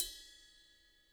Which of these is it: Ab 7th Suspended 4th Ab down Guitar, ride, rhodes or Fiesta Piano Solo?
ride